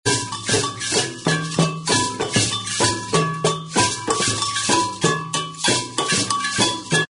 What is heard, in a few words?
african bell rhythm